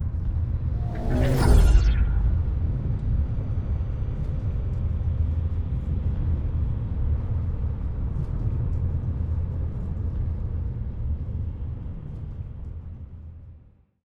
ghost ships; Stellaris; overlord

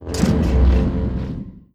click embark all; Stellaris

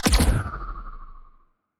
healing projectile, weapons; Stellaris; biogenesis

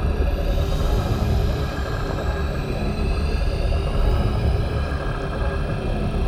toxic god windup; Stellaris; toxoids

